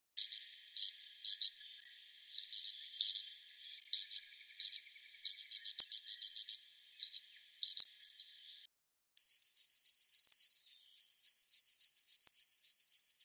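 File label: white browed Scrubwren; scrubwrens gerygones thornbills pardalotes and allied birds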